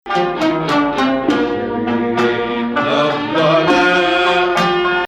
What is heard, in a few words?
Rast, maqam; Dalansheen Ijma3 Shufna